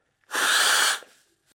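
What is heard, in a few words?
nose blowing sound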